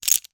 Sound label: rummy o bricks stack